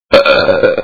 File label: belch